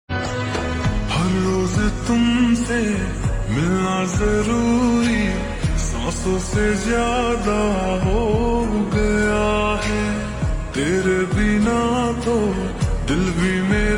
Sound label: Ringtone Slowed Reverb